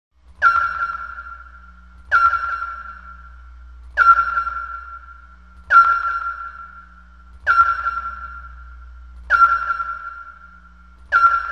Spaceship Ambience; Sonar Blips With Echo And Transport Ambience.